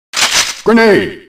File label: grenade; metal slug 6